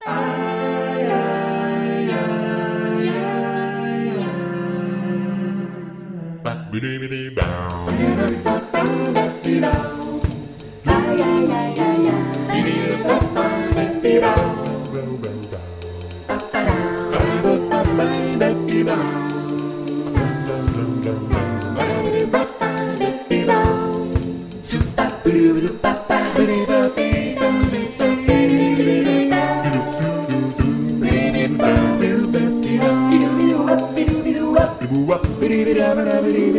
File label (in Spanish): Nubes a CAPELLA